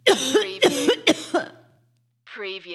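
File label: Female Cough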